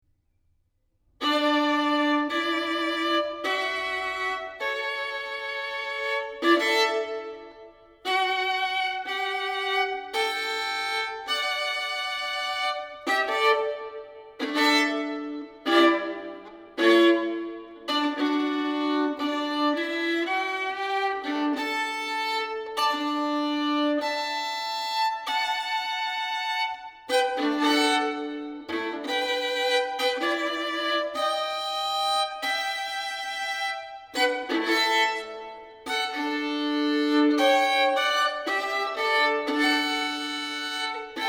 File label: Solo Violin